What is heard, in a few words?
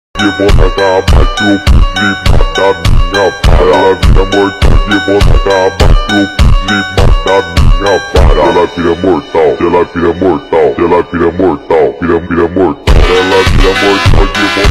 PHONK SLOWED+REVERB